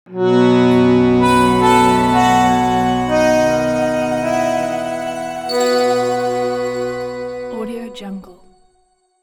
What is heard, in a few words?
harmonic logo